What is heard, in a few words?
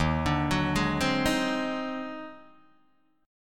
Eb Minor Major 13th, Guitar, DADGAD; EbmM13; arpeggiated; JGuitar